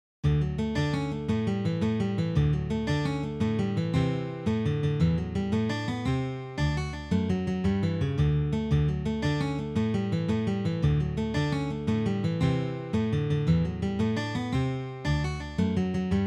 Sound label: Jig